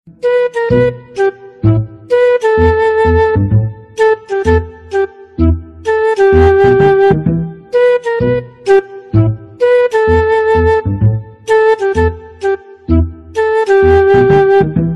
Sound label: background music